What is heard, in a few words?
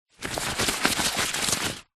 rubber glove handling